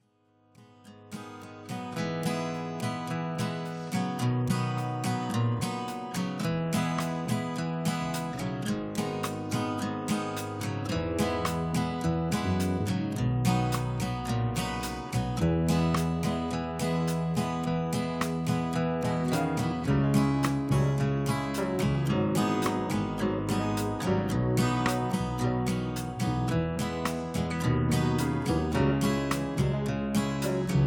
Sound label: jammin with the guitar